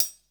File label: CL HAT, CYMBAL STUFF; drum